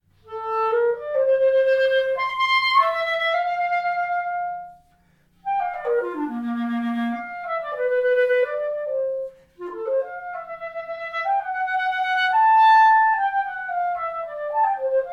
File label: clarinet